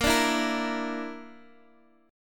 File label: Bb Major Flat 5th, Guitar, Open D; x,13,12,10,13,x